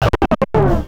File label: empty; singe; Space Pirates HD